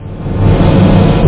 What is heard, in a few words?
bunker, whoosh